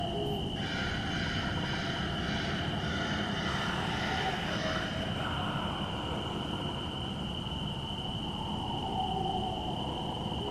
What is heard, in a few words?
dead forest, ambiance